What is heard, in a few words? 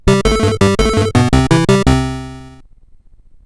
Manic Miner 64DX, CSG8580R5